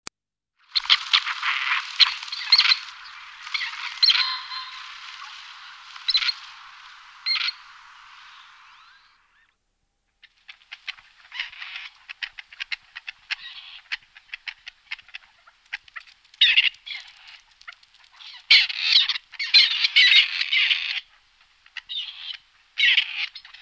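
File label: seraya kuropatka voice, grey partridge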